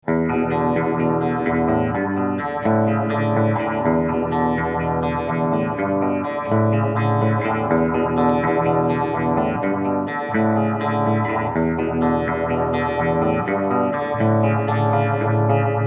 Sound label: fast chorus, phaseomatic